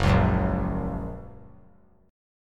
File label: F sharp Major; strummed; JGuitar